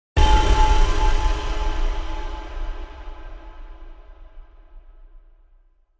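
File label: Impact Metallic Chime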